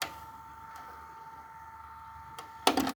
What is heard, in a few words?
VHS start play; SoundsCrate